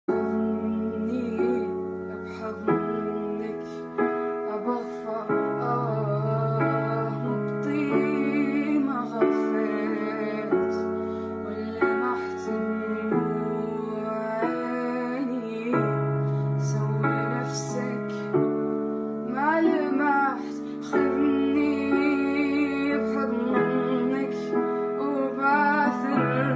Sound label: arabic song